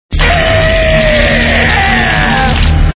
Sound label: scream; courage